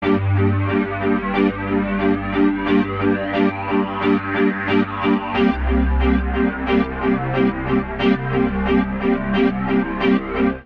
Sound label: Pad (Gm) 90bpm; Music Loops; Thunder Coast Pad; Ableton; Factory Packs; Singularities